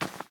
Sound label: Ground short